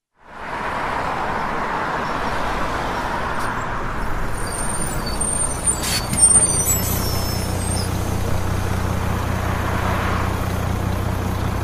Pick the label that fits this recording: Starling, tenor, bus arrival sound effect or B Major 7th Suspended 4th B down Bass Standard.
bus arrival sound effect